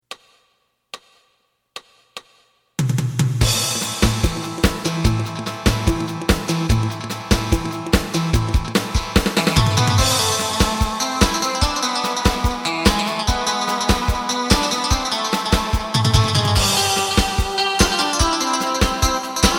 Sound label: bass